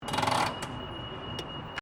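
Trolley Motorized Luggage Train Station Handbrake, Electric luggage trolley; Carriage; Carriages; Vehicles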